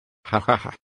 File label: hahaha spanish cucurucho